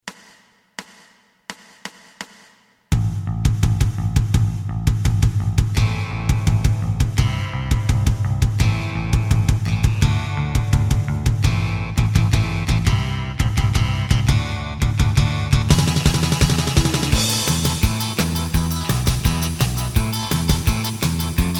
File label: lead